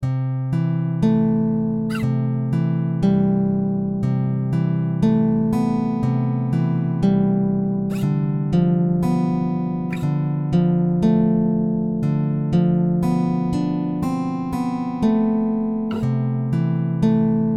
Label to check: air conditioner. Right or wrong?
Wrong